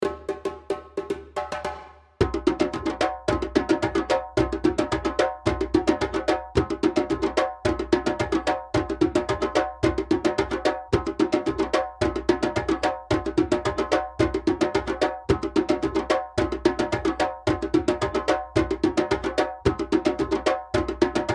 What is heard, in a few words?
kuku djembe all; rhythms